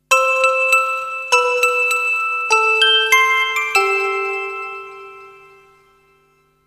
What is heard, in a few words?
christmas notification; Pop